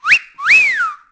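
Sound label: svist, whistle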